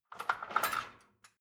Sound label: dishwasher cutlery basket rattle